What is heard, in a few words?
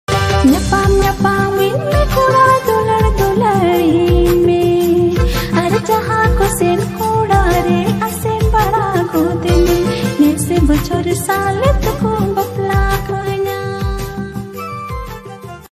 santali ringtone song; santhali